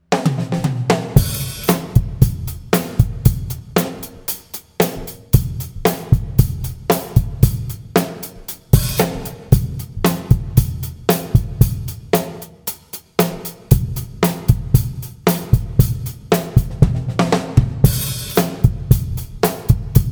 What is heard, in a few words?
Drum Sample